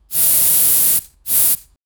a clean graffiti spraying

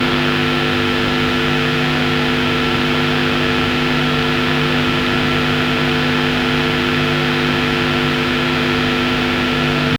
soft static hum faint radio; radio tuning

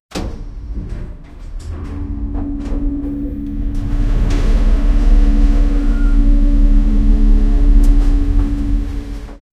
elevator rumbling

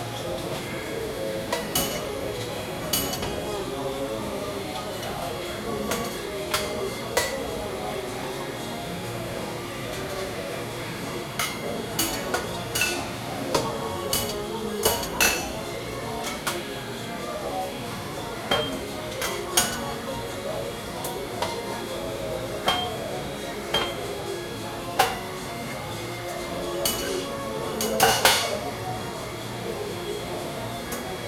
busy coffee shop ambience

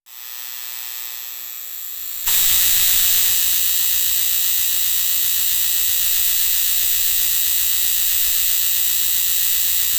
sequence, electrical

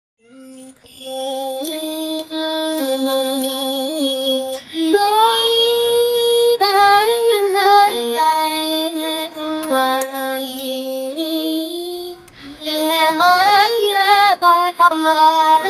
india music singing

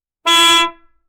classic bicycle horn honk

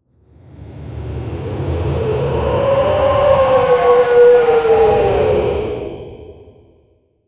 t rex roar; dinosaurs

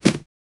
simple item move; object manipulation

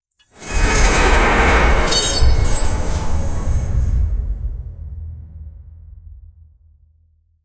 interdimentional portal, interdimensional

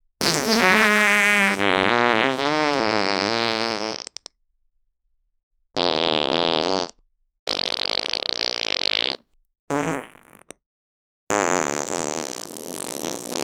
really disgusting long sh, disgusting

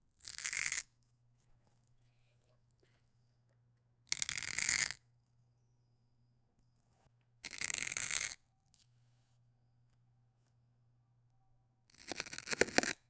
knife slicing through the, food

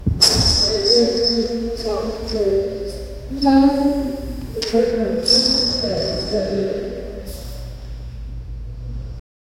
womens prison hallway

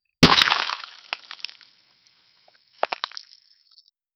generate a sound such tha, ice

